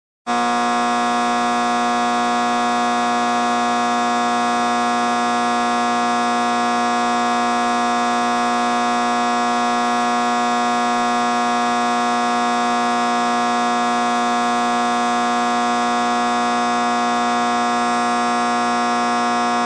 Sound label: Loud and bright high voltage buzz